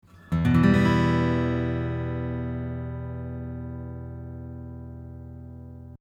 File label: GAPrem73 AGit AIR 6db GA 47